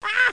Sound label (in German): möve; Loewe1